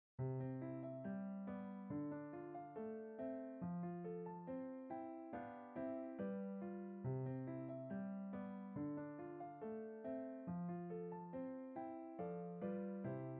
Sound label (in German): Klavierstuck; Ludwig van Beethoven